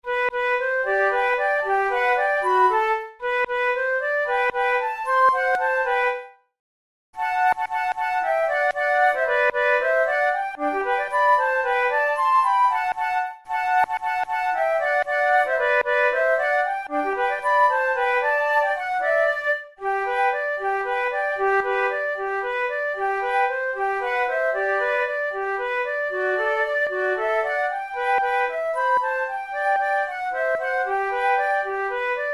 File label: duet, flute